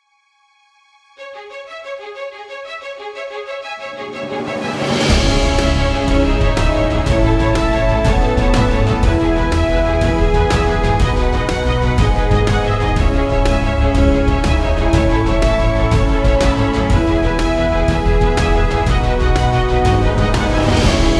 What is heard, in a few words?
sports music